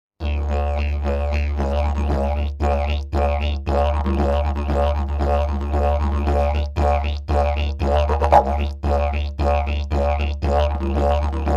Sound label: didjbone